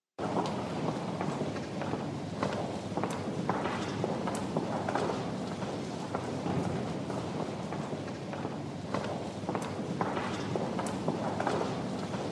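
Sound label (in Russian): подземный переход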